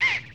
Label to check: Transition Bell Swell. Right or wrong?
Wrong